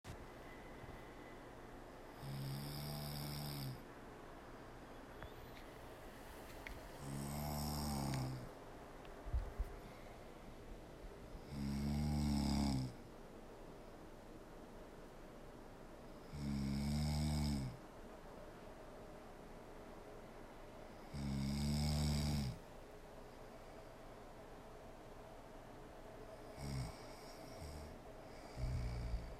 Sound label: woman snoring